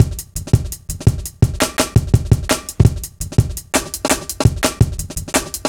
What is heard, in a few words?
Live Wire, Drum Loops, 168.5, Jungle